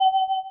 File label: book picked up; sfx; CultManor